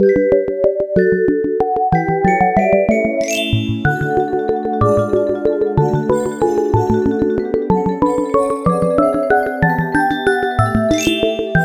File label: Underwater; Super Mario Maker 2; NSMBW